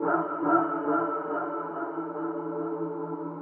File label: techno pad 140; Techno2; Keys